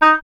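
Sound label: WIND OBOE; Miroslav Vitous; Solo Instruments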